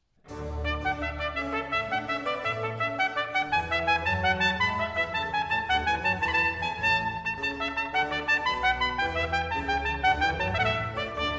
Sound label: baroque duet